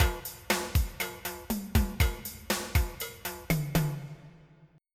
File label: SALSA; Millennium Gold 2000